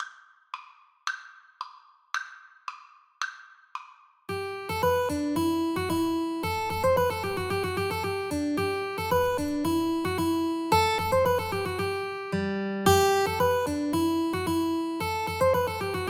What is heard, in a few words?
mandolin cello, Mandolin-Cello Duet